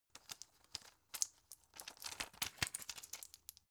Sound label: plastic blister handle long